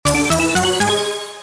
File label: big win